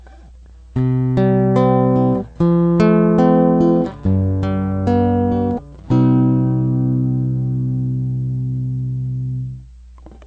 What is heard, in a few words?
c gtr sng